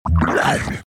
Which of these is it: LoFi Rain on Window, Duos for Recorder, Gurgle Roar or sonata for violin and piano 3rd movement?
Gurgle Roar